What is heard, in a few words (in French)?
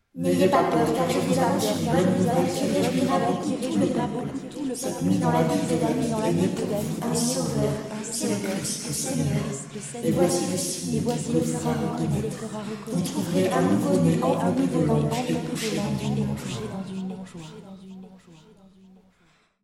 voix n'ayez pas peur